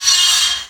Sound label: saw, circular saw hit; weapons